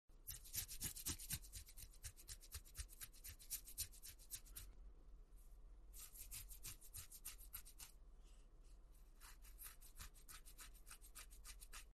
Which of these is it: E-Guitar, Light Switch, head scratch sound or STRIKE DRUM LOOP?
head scratch sound